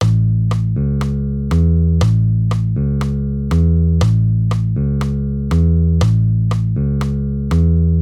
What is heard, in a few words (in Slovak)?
basa rumba A; basove figury rumba